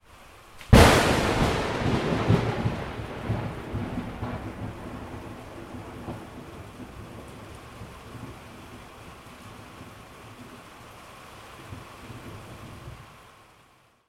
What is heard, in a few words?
kaboom; tetherdcow; cowsound